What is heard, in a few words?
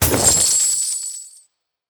Coin Bag Underlay Death